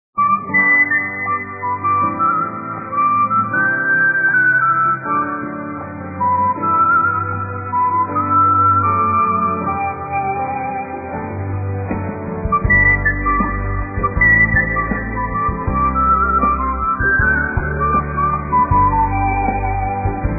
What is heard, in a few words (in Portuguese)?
assobio